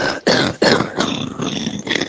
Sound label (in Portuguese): tosse